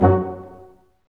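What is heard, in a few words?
BRS Large Ensemble stac; Roland L-CD702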